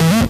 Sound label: Acid Sounds E, Synths; Vengeance Samples